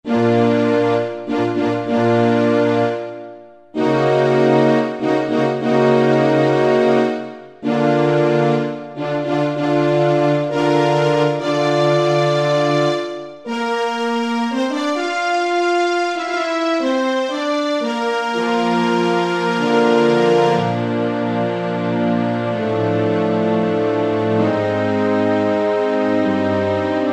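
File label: Orchestra 2, Yamaha QY70; XG Voice examples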